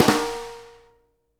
snare GLASS, Live Snares

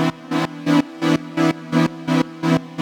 Pad DS201 170 E, sidechained

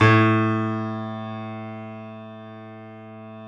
Small Pianos, 3-D Audio Collection

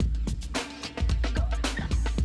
drum loop, 100 BPM

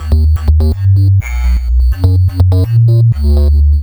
Subb Glitches, Bass; Sonic Foundry (Sony Creative Software); Underground UK House & Electro

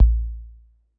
Korg 01W MegaDrums; 300 Drum Machines

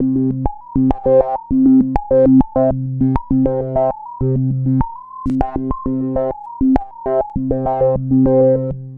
S+H REZO, Trance, SYNTH; AMG; Global Trance Mission VOL-2

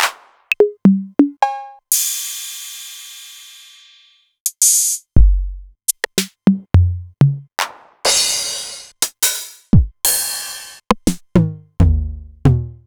Novation Drumstation; DrumstationV1

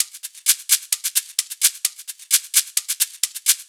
Live Percussion, 130 BPM

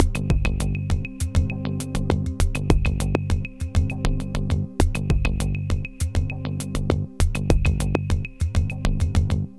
Elektro, Processed Percussion; Sonic Foundry (Sony Creative Software) - Rhythmicronics Processed Percussion

